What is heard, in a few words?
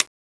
357 chamber out